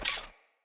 Dagger Unequip; Weapons; Player; Swords; Fey

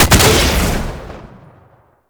Exalted Sniper Alt Fire Shot; Frumentarius

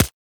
Weapon Punch Pistol; Warframe; Weapons

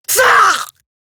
death, female; Warframe; Eximus Grineer Female; Battle Barks

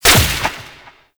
Conservation Orb Destroy; Warframe; Duviri

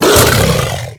Kubrow Feral Vocal Grineer Finisher Jump; Warframe

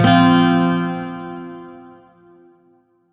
Shawzin Chromatic Chord; Music; Warframe; Lotus Shawzin

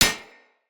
Short Sword Medium Impact Armour

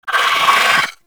Vocal Pain; Warframe; Moa Satyr

